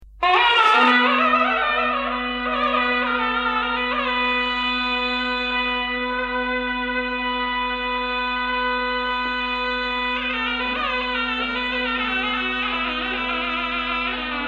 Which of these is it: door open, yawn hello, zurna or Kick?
zurna